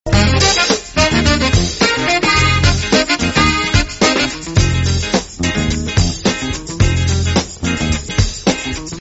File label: ringtone jazz